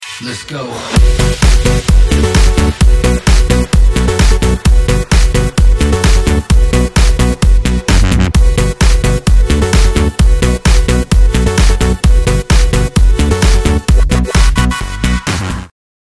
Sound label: Electro Hit